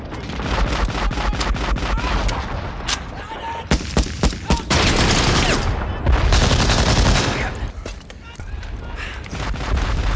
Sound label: ambience; dod